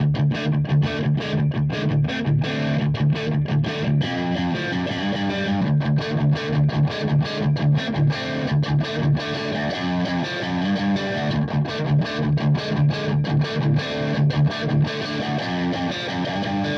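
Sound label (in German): Verzerrer Pedale Transparent Overdrive Gain Channel; Morning Glory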